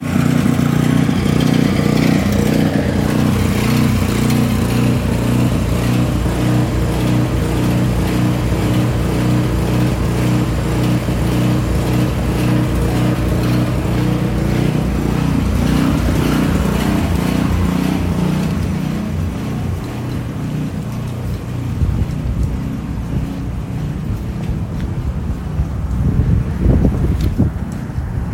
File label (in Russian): ritmichnij gul stroitelnoj mashini ili generatora v nyu jorke